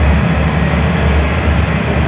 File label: buzzer machine; svencoop; russian sounds